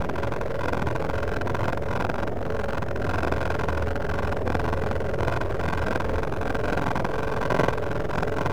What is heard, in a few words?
Drone Ambient; Drums & Drones